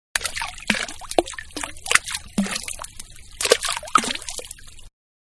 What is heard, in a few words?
Splash Small Stone Into Water; Stones Small-Showered Into Water; Rocks; Materials; Manhattan Production Music